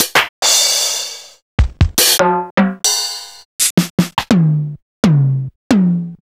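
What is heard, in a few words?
Roland MV8800 drum KIT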